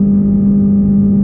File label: Fast American; 暴力赛车